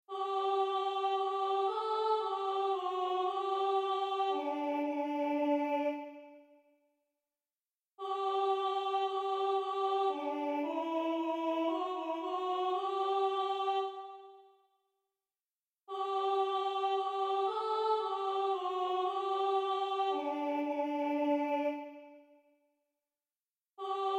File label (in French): alto, enregistrements audio séquenceur; ojesusmajoiedemeure; Bach; Classique; Chorale 3 voix